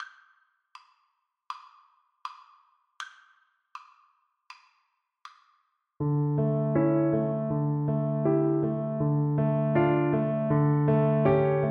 ai kuinka kauniilta kuuluupi FL kar, Flute

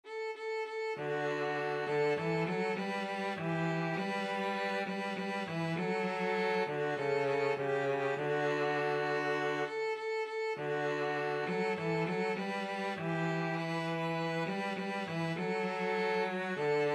violin cello duet

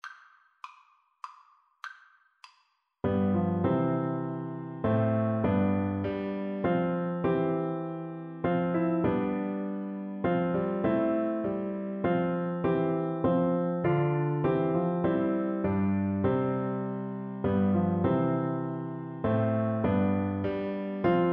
first noel BN, Bassoon

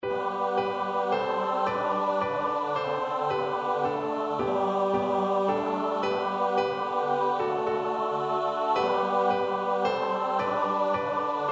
choir

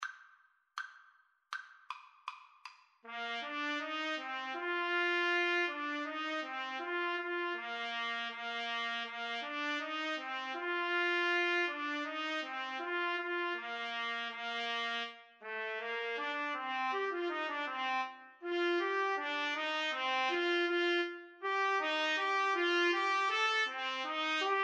trumpet duet

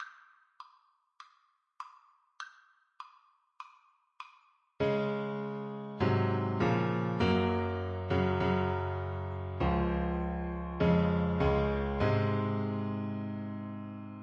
nearer my god, Saxophone

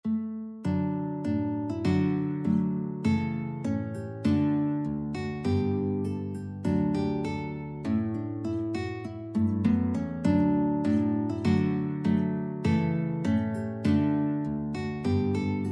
guitar trio; charpentier; te deum

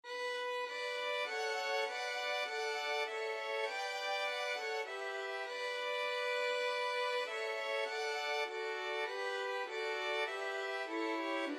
violin trio

